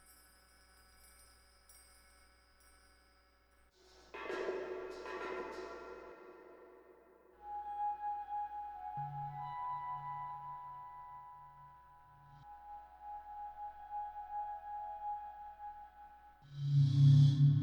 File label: windshear waste ambience